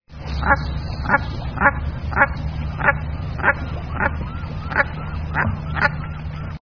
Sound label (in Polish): bernikla obrożna